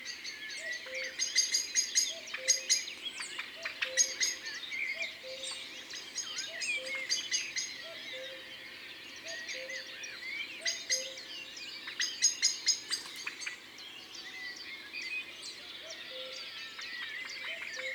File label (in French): Merle noir; Concerts naturels de l Isere; Studio Les Trois Becs